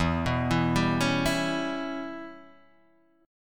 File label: Db Minor 7th Flat 5th E, chord